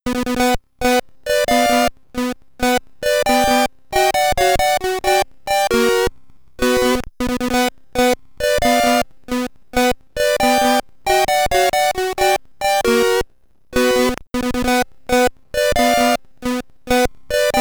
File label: Game Over, SID Stereo; Castlevania; Commodore 64